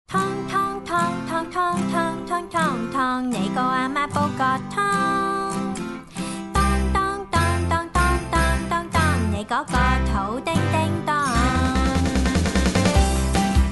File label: ringtone